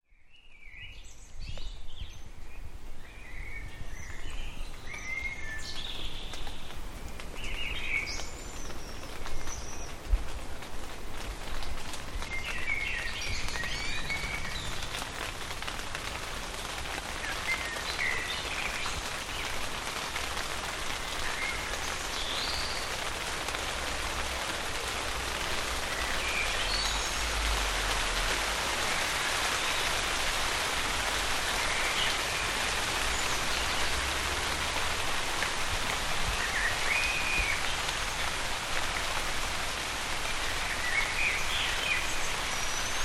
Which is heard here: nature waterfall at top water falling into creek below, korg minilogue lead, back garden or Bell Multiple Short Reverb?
back garden